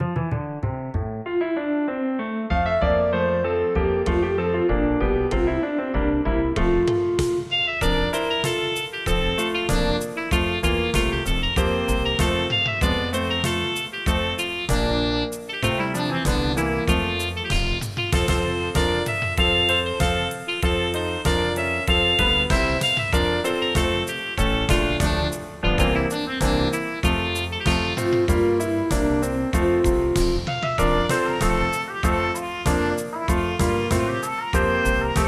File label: girl left behind, midis